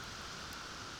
Background Noise

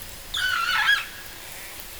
call

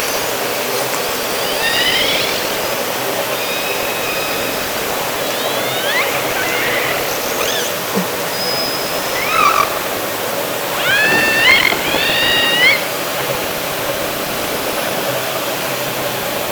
Orca sound Night Passby

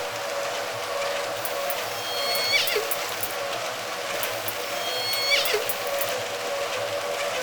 Orca Noise

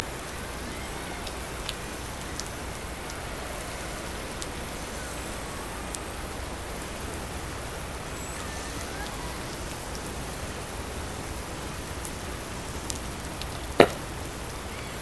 Hydrophones, orcasound

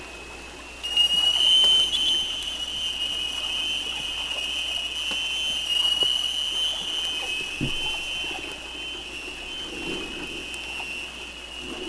SONAR

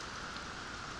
Background Noise

